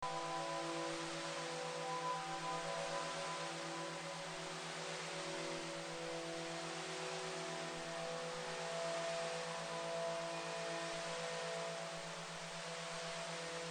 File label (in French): synchronisation ondes cerebrales; boost de la creativite